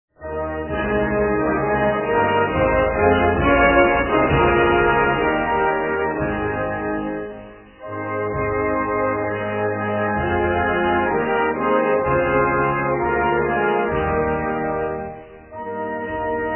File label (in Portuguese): hino da suecia instrumental